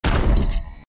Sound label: transform sound; transformers